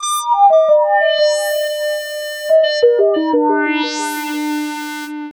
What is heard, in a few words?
90 G Disco Lead